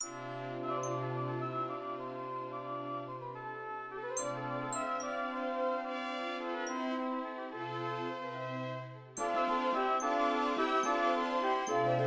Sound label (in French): leve le pieds orch